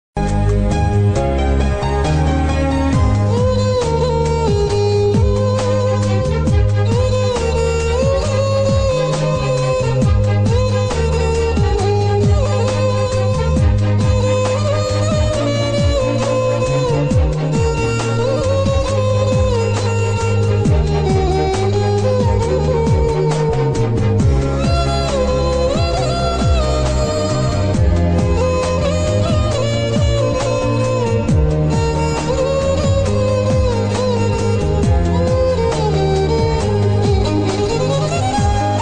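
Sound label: Kannada monsoon music love feeling bgm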